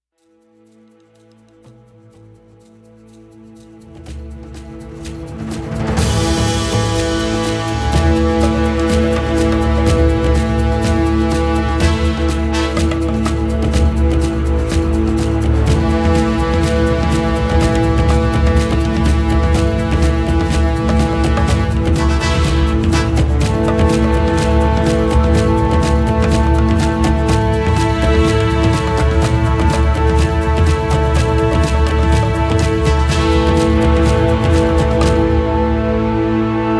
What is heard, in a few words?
Combat Theme